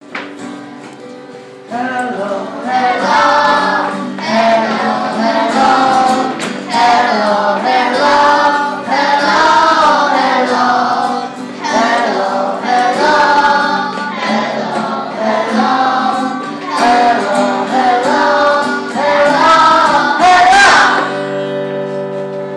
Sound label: hello song